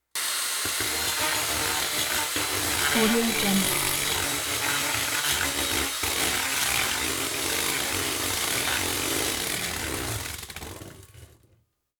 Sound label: power tool; prosoundfx